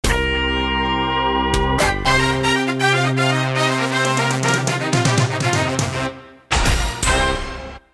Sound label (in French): Emission link, jingle